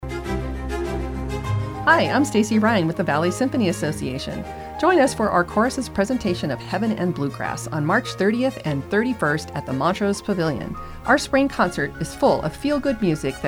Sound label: Heaven and Bluegrass